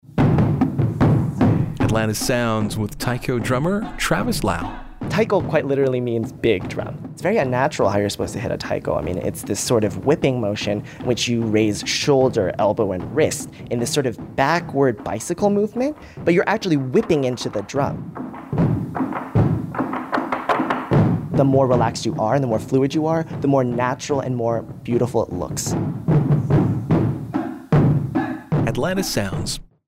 Taiko Drumming

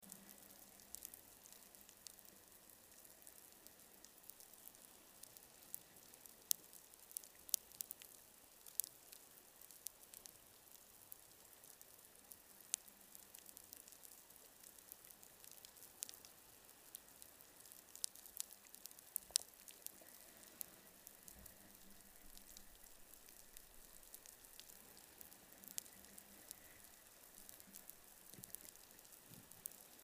ambient int

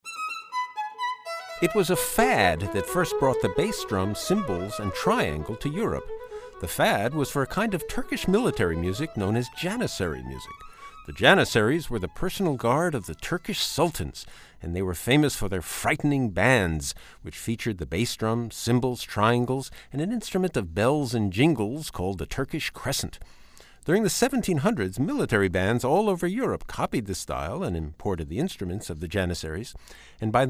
Bass Drum Cymbals Triangle

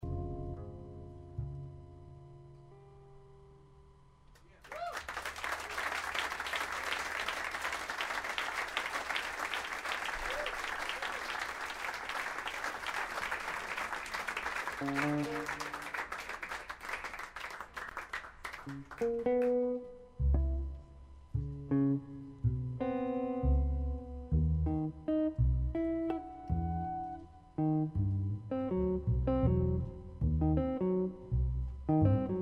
jazz

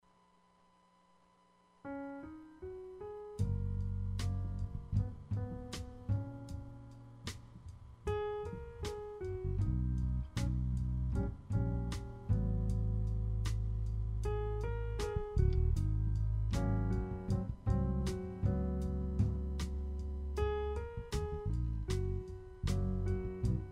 jazz

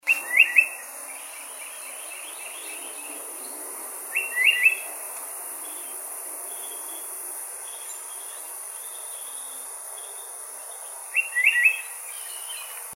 unknown bird; margaret farese

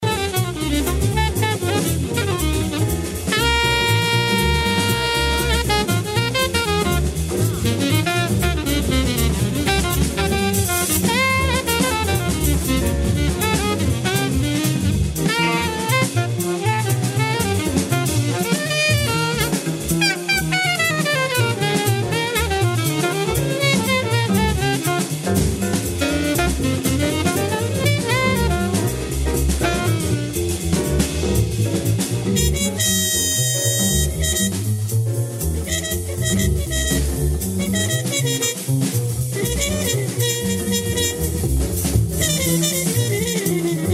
jazz

